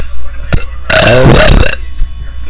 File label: burp